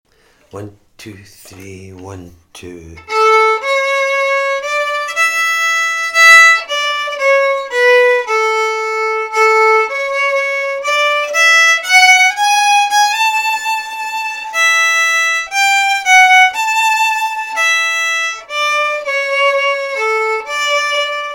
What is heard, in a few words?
Bridal Song, Waltz; GFW Slow Session; Waltzes; Tiree